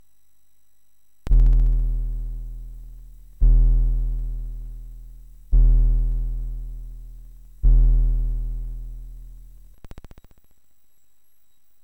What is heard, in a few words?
ARMSID 8580 ADSR fix; The sound is louder than my recording of the real 8580 on the C128D, there is some crackling noise, but no aliasing.; SID replacement; firmware 2.16